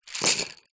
office pencils x30 drop on carpet

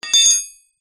impact metal dowel thin place down on concrete

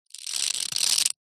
bottle beer lid cap metal scrape concrete

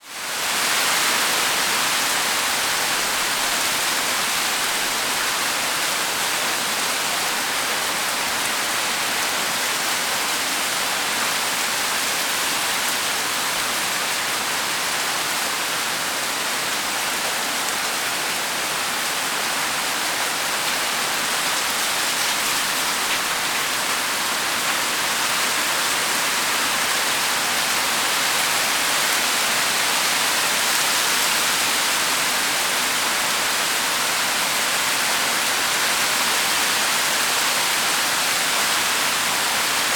rain fall on wet road slightly flooded

